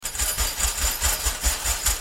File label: chain multi